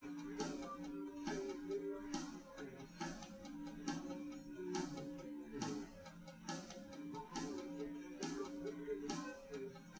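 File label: test with drums live rec; SiSEC11; underdetermined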